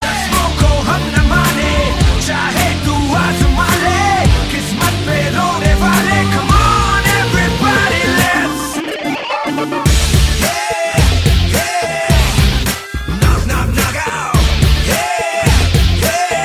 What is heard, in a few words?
Rock